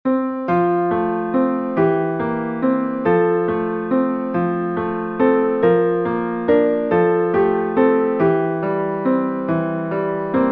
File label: piano; plaisir d amour; jean paul egide martini